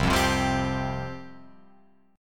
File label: C Suspended 4th C, Guitar Open F; Csus4 strummed; 0,0,0,0,3,2; JGuitar